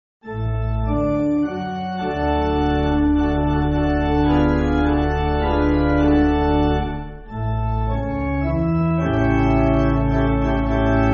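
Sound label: Pipe Organ, Music Backing Files for Churches; Ambresin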